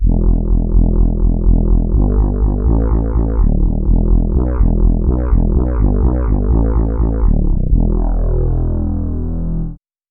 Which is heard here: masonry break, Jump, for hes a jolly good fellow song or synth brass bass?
synth brass bass